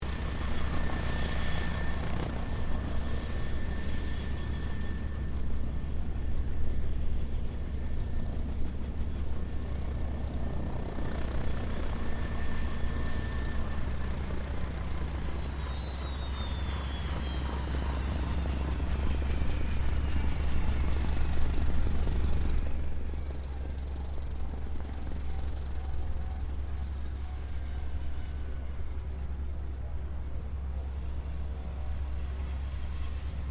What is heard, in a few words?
HELICOPTER BELL UH1 IROQUIOS SHUTDOWN; RAN UH-1 Iroguios; Helicopters (Sound Effects:Vehicles)